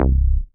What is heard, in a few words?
DM BAS, Dance Mega Synth